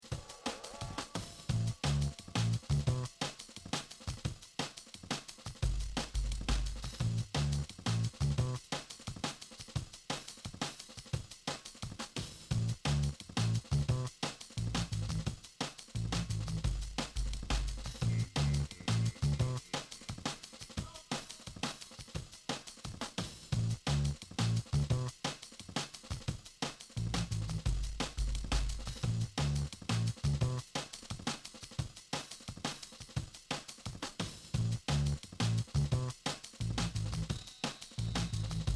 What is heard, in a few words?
BASS WEEDY DRUM